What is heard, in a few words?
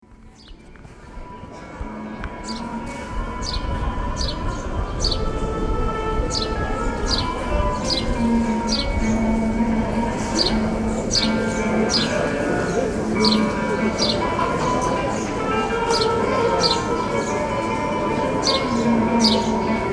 Guitarist